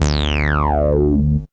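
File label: synth sweep; E-Lab; X Static Goldmine 1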